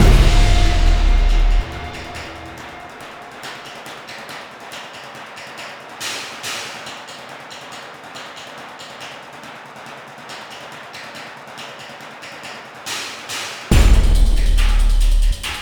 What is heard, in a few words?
Suspense; Prototype